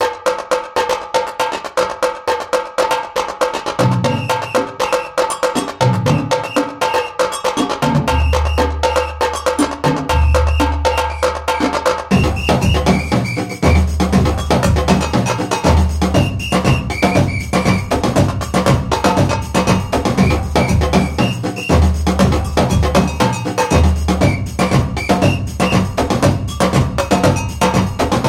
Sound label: Brazil Drums